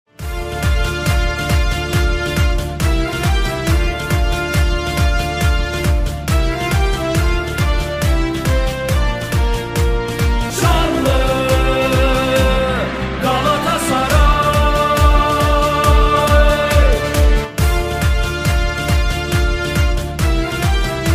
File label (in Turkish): telefon zil sesleri marsi; galatasaray